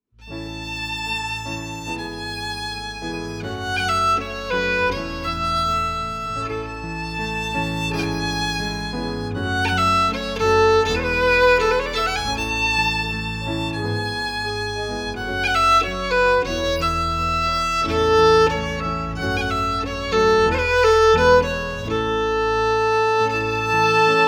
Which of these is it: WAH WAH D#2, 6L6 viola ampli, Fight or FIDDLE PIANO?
FIDDLE PIANO